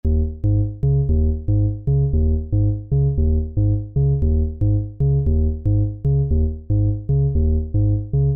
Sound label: modulations